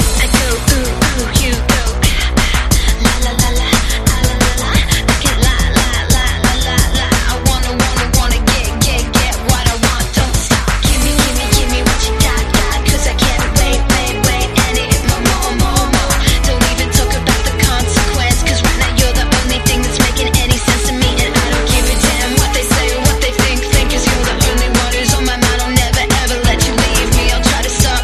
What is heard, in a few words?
Slam End Redrum 177bpm Abm